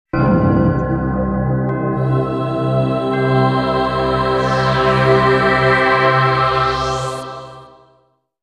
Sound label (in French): VIRGULE SANCTUAIRES